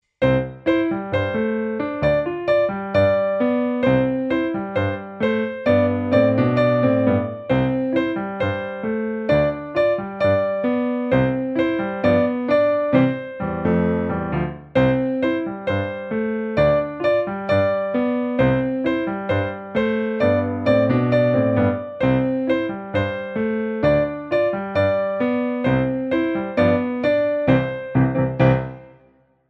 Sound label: Cha Cha Cha with teacher duet